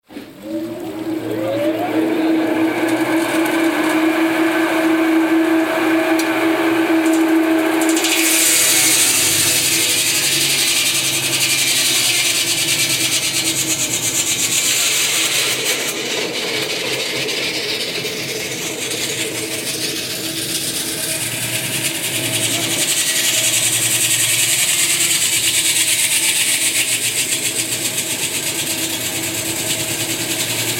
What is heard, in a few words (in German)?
Saege, Arslanbob